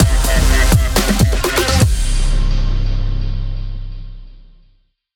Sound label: Unknown Jingle; Street Fighter 6 Beta